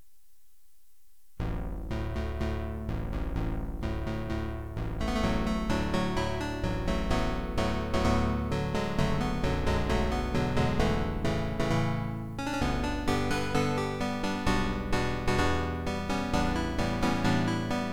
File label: synth